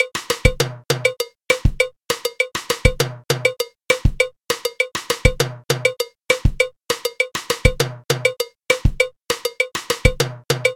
timba songo laye

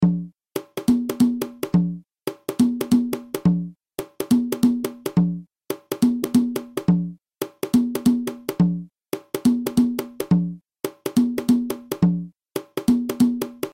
guarapachangueo cajon

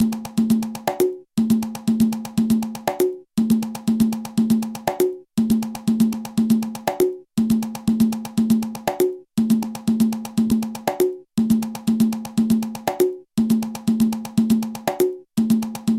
guaguanco matanzas repicador

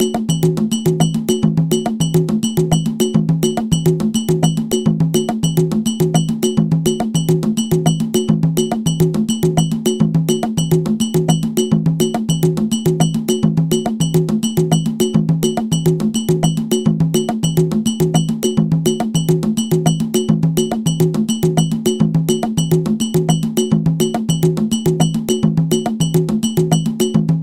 abakua havane; Les sociétés Ekpé

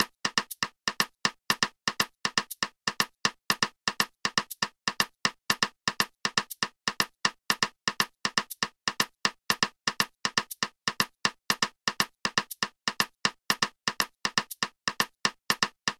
guaguanco habana guagua

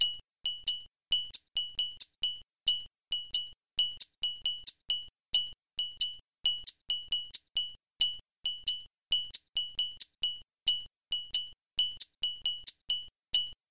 vodu maisepol trian lent

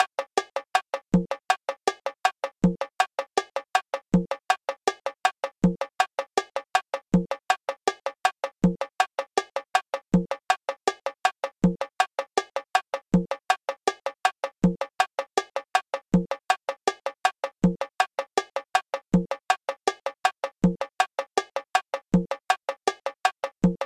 son bongos

